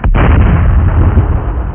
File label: boom boom